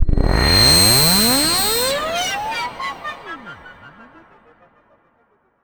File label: Misc Short FX